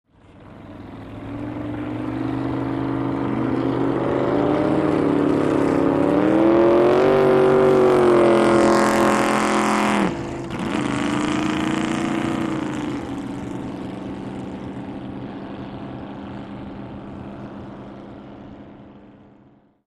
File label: Drag Boats By Slow, drag boat